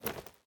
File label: samurai armor; smite; god odin